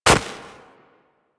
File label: Walk Snow, Footsteps; Warframe; Operator